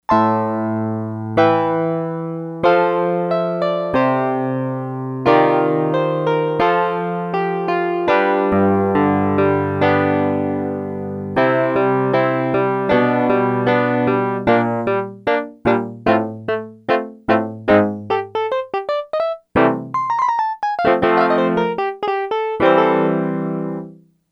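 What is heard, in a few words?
Upright piano, Yamaha DX100; Factory Patches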